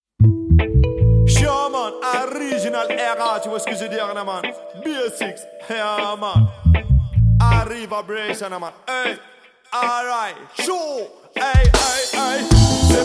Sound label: riddim